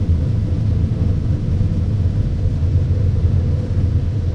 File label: leo2a5 engine int rpm 00, tanks; engines; War Thunder